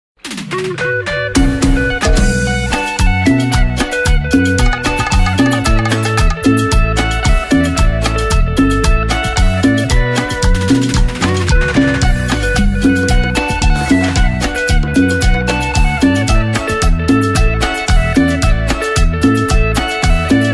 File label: Cumbia